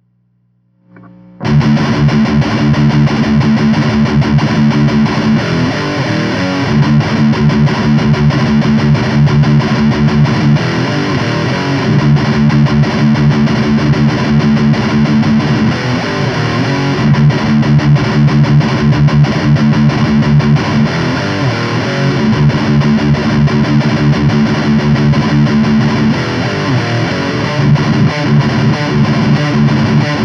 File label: Bass